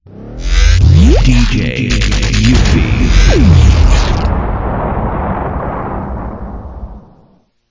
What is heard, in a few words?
DJ drop